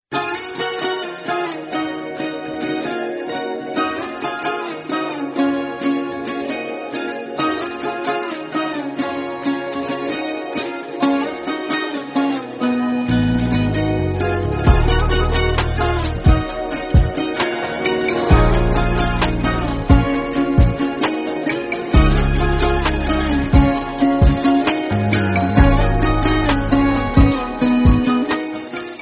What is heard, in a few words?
turkish theme